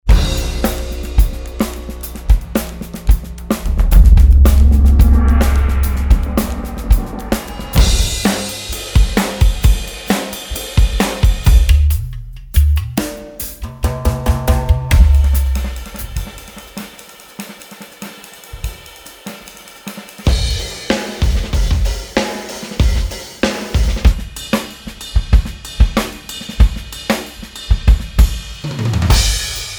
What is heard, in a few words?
Drum Percussion